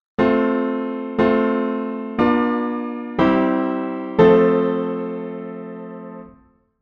authentic I IV V, 16 edo; 16 edo theory

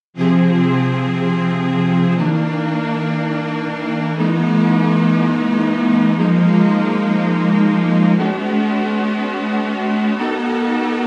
strings detempered

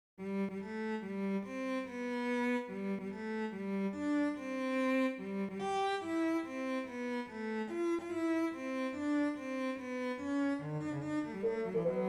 Happy Birthday Canon 50edo

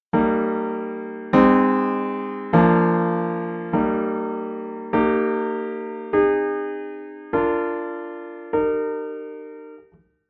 17 cadences, 17-ET

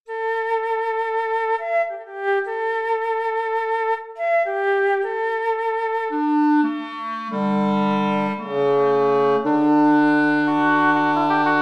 archytas hobbit in sync, hobbit scales

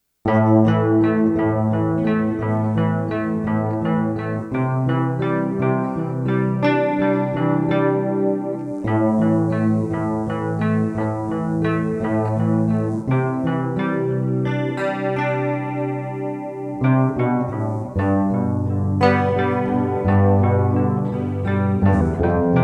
Harp of New Albion scale; tuning survey; Terry Riley; inverse Malcolm's Monochord, tonic on C

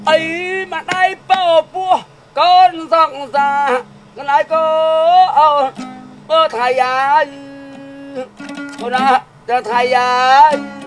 voice

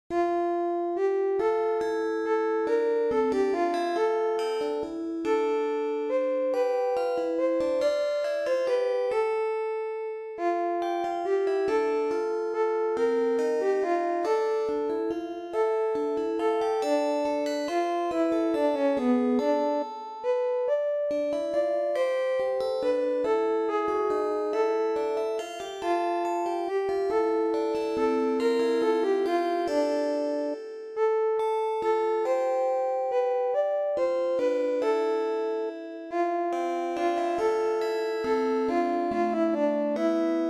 Ballade; Antoine Beaudet

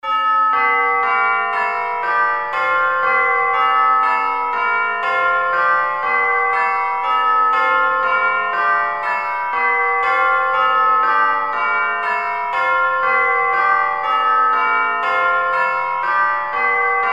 plain bob minor

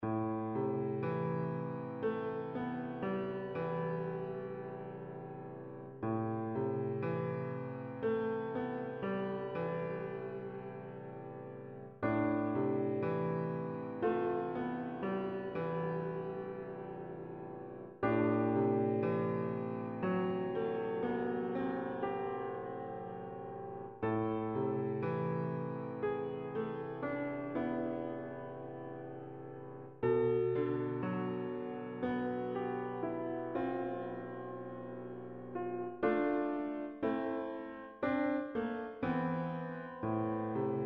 67 edo; Xenharmonic Alliance

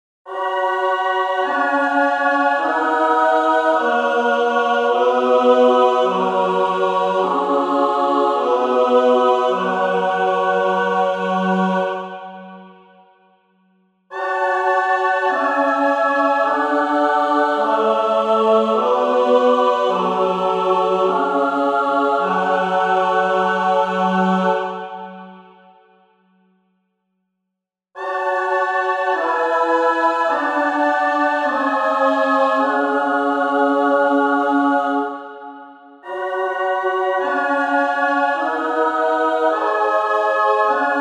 17 prog choir all, 17-ET

